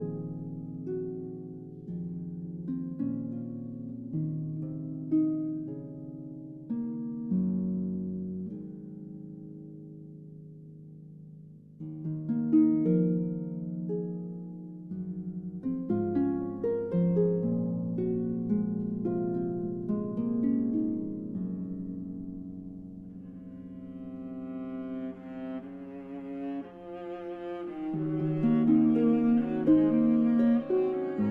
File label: Harp Concerto Lullaby